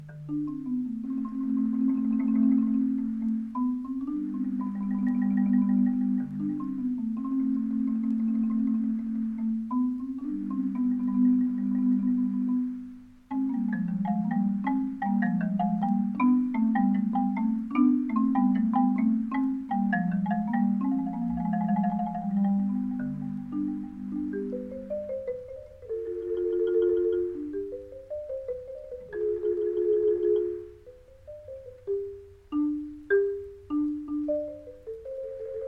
percussion tropical; percussion spectrum

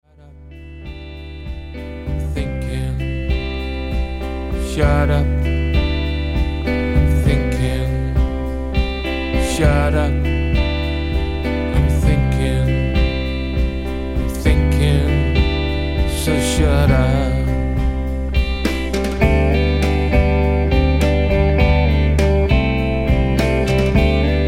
piano song

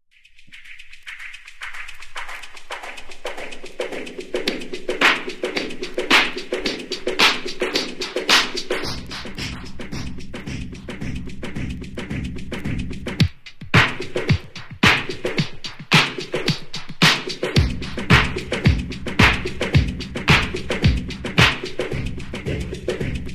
afrobeat

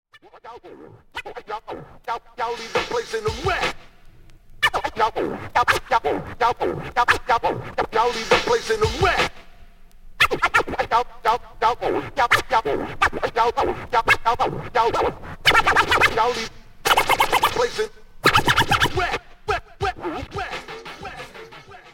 scratchapella

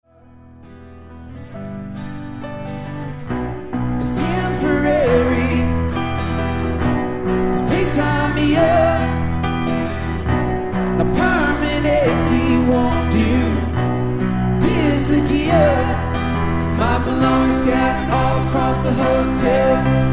acoustic

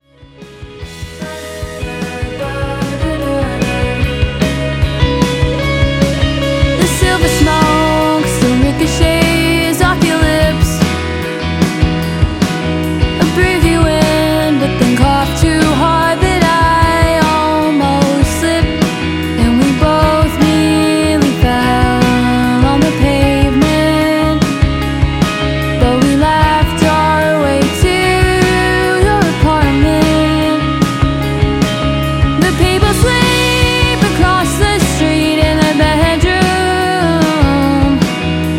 karaoke

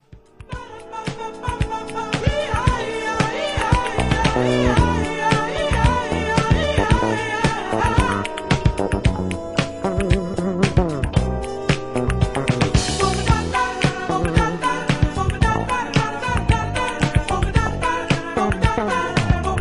brazillian rhythm

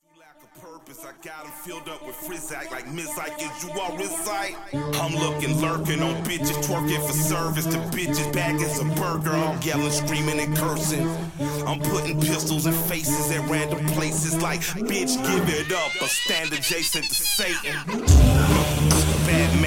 meow purr

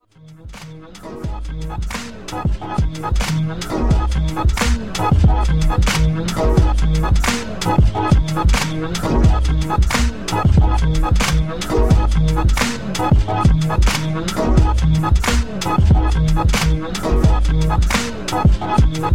piano

